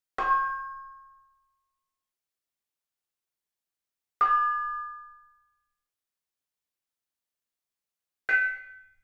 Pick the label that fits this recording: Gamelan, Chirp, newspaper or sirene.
Gamelan